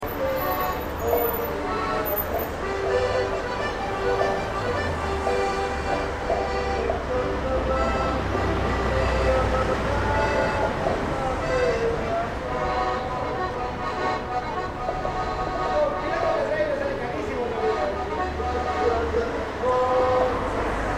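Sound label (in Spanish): calles acordeonista obra en construccion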